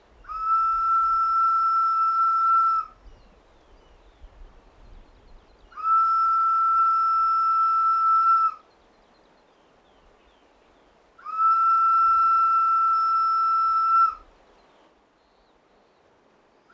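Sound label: 3 whistle courtyard rec from top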